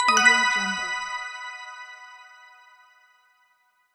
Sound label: christmas Win